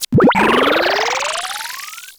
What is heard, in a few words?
Koopa Clown Car Big; Bowser Jr; New Super Mario Bros. Wii